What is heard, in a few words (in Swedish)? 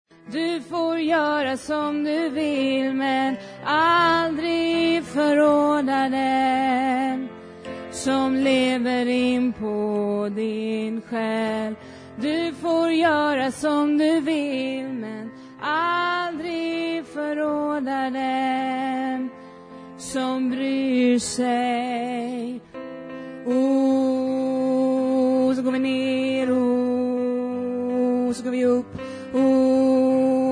alt, stammor